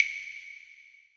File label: clave